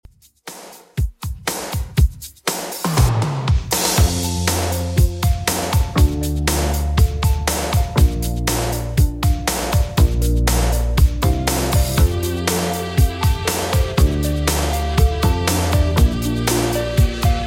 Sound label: 80s Redrum 120bpm